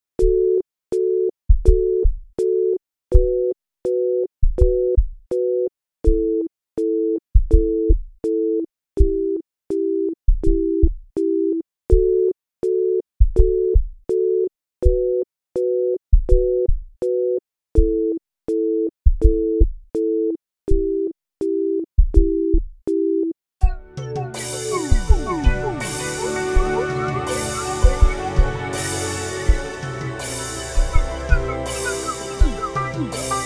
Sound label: pulse; spellman studio